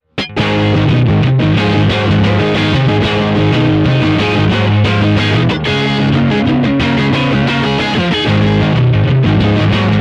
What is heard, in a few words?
blues mode